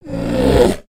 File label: Pet Panda Speak; creatures pets